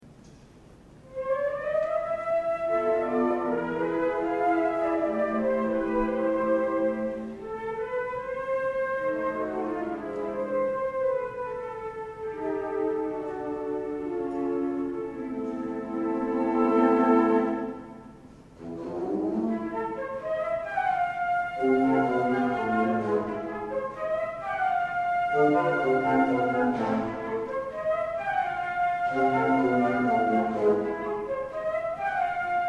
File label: concert, flute; arietta-flute